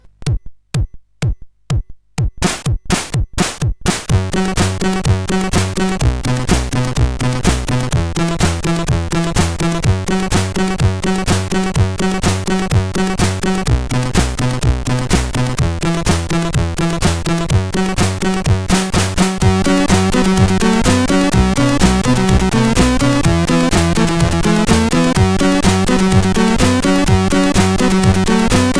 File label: Disco, sid CSG8580R5; Signor